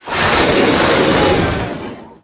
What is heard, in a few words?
roar hiss; gonarch